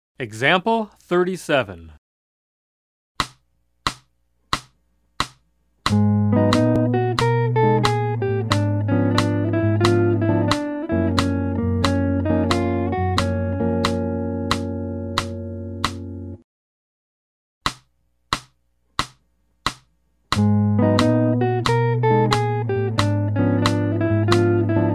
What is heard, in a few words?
Jazz